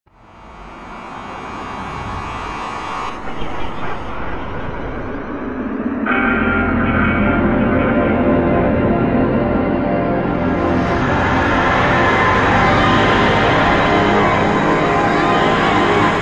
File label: airy